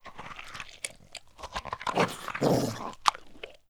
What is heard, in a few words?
dog eating